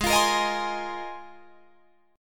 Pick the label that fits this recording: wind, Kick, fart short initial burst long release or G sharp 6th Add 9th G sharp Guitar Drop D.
G sharp 6th Add 9th G sharp Guitar Drop D